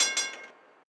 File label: Tram Bell